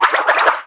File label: Multi Sword Swing; Monk; Playable Tutorial Level; TR2